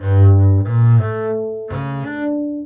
Bowed Groove Gm 90

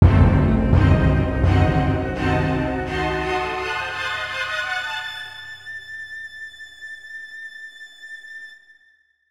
MUSICAL ORCHESTRAL ASCEND DRAMATIC

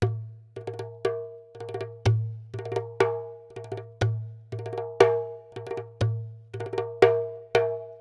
Jembe

